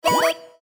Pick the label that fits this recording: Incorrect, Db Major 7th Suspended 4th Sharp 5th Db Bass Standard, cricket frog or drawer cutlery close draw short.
Incorrect